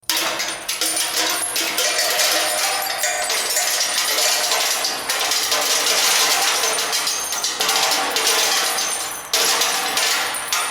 metallic drops and echo

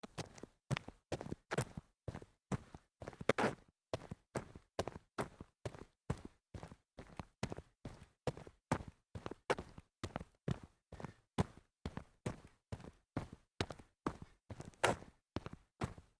sneaker on concrete series fast

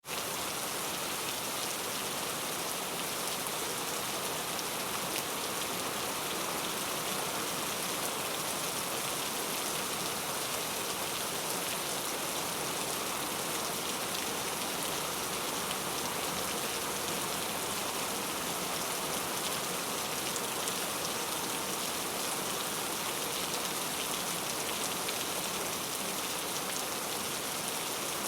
rain heavy loud

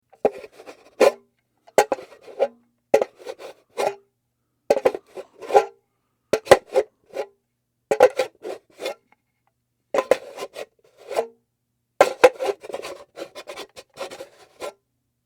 tools scraping, foley

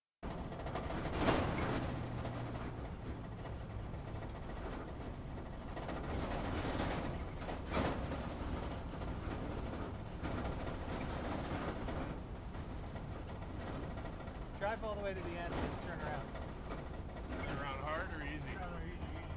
off roading interior

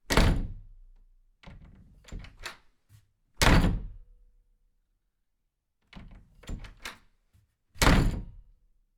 door opening closing

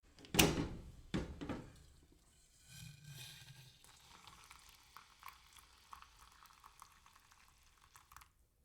pour boiling water into cup

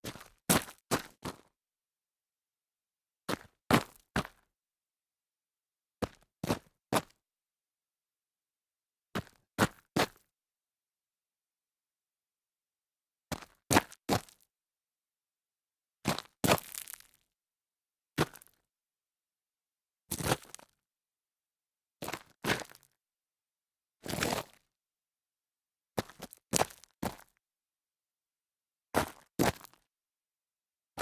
shuffling feet gravel

